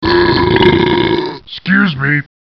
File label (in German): ruelpsen